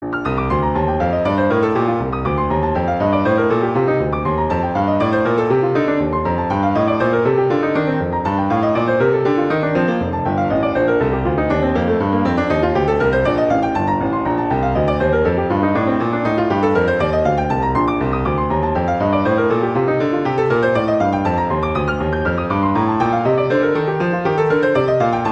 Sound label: Exercise - Bb major; Clementi; Preludes